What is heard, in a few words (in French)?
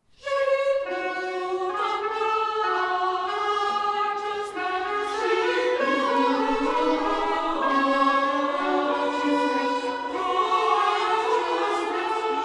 ensemble vocal baroque; i cieli immensi